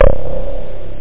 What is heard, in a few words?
303 soft bass; tb303 samples; Amiga 8-bit Sampled Voice; Mecomp Multimedia 1